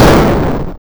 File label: boost; BoostBaller